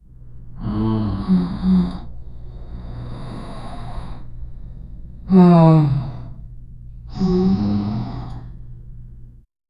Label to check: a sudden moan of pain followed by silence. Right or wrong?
Right